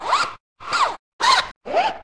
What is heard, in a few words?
zipper mix; best service Blue Box; mixed sounds short